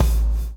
BLOW; Spectrasonics - Backbeat

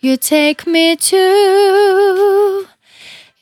Finally 70bpm C, Velvet Vocal; Zero-G

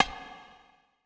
Bongo HI, percussion reverb; MARKYDRUMS

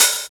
DR. LOOP, DRUMS; X-Static Goldmine 3

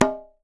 bata, African set percussion; Voice Crystal - Steve Reid Definitive Percussion

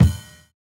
Kick, jungle breaks drum sounds

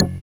SYN DANCE; Sound & Vision - Gigapack II

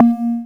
A#, Synclav FM PERCUSSION; Big Fish Audio

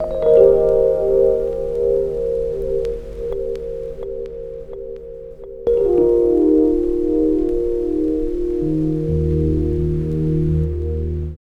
Melody, Drum & Bass Melodic Synth

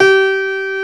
STENWAY; East West; Global Sounds

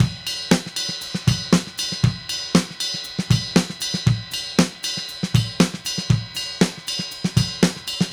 Drums, 70s Analog Funk; Sonic Foundry (Sony Creative Software); What it Is! 70s Analog Funk

